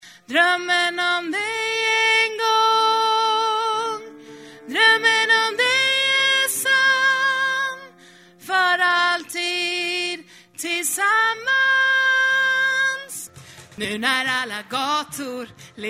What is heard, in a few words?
tenor